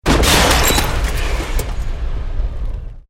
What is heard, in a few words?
Explosion Medium Metal Glass